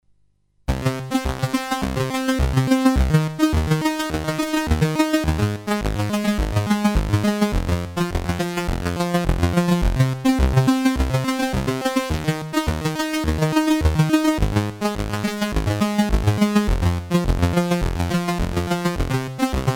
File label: Phaser Hall SID off